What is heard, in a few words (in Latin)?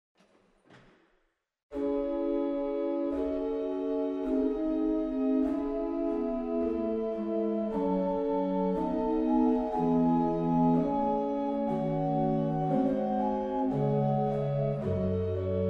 II Unda Maris 8; waltershausen